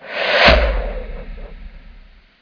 pet Fire

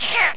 pet grumbl

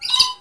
hurt Spider

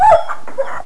hurt Werewolf

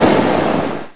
pet Impact

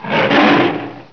hurt Tiger

